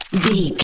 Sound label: gun shotgun pickup